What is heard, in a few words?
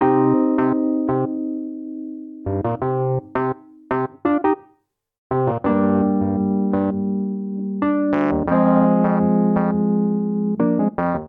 Vamp Reggae i - v - iv C Minor 85 bpm, Electric Keyboards; Ableton; Factory Packs